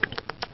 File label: mouse eating